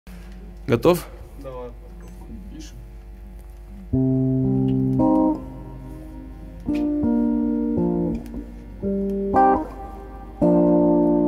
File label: gitara